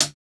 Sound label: hihat, house